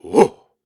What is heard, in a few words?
Grunts and Chants, Viking Vocal